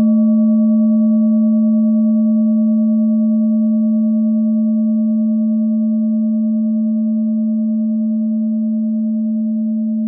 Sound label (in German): klangschale tibet